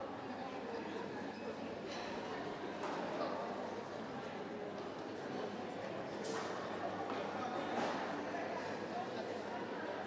Restaurant Crowd Ambiance

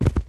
Cloth Thud

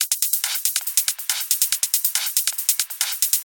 Hi Hat Loop

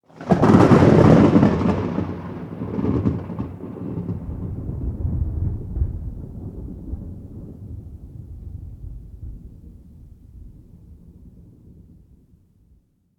Thunder Strike Close Indoors

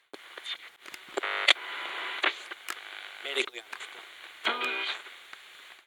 AM Tuning

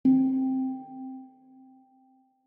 Handpan

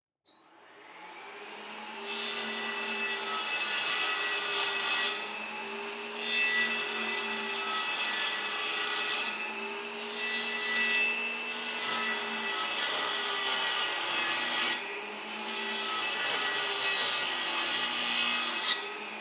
Grinding Wood

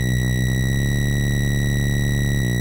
Drone

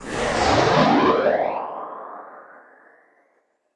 Sweeping Air Whoosh

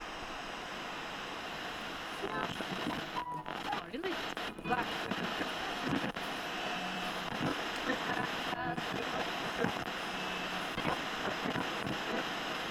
FM Radio Tuning

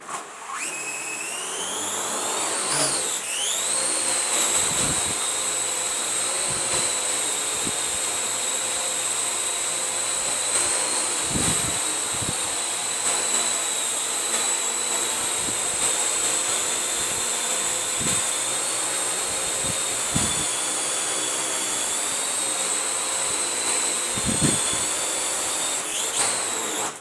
Parrot Ar Drone 2.0 Indoors

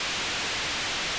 White Noise

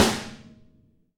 Snare; Veiler

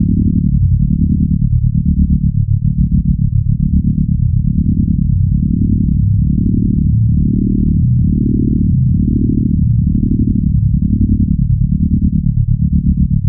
Deep Layered Tones

